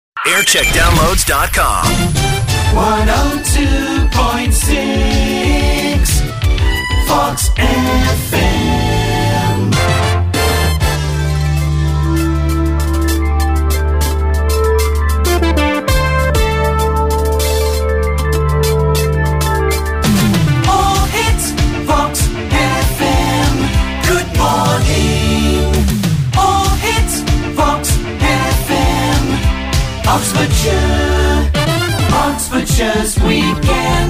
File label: Jingle Montage; Fox FM; JAM